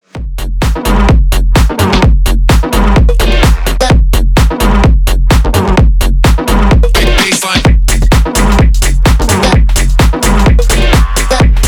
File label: bassline